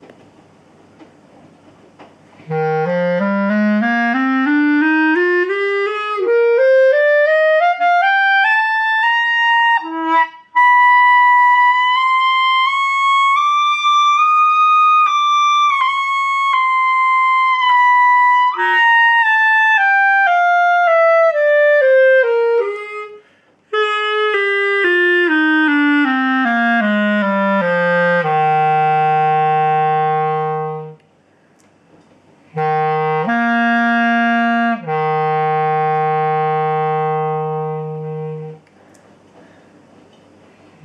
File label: scale, clarinet